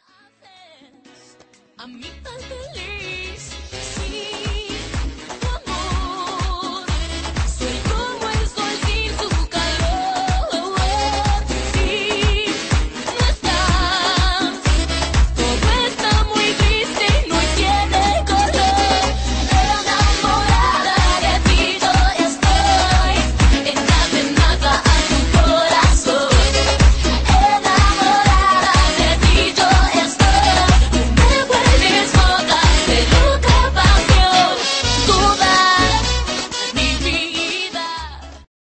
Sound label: merengue mix